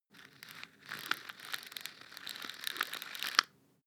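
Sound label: floss pull out slow, bathroom; haus-garten; badezimmer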